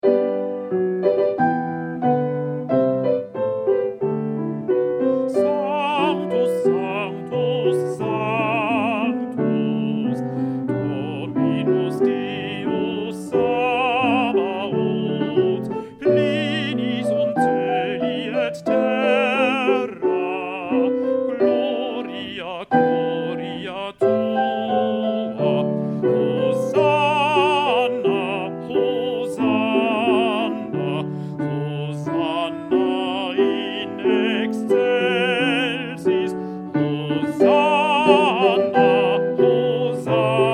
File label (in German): Sanctus Sopran; Weihnachtschorfreizeit; Übeaufnahmen